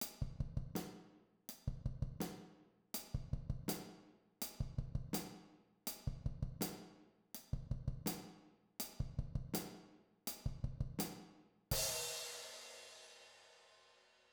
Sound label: rock beat, drum set